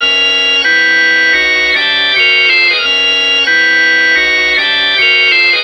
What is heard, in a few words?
organ